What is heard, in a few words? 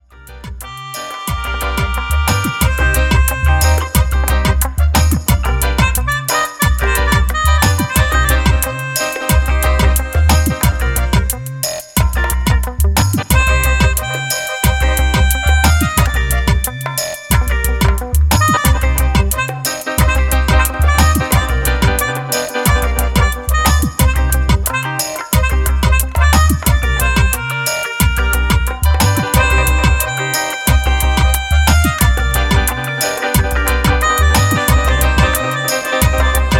Harmonica Ride